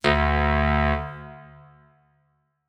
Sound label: alarm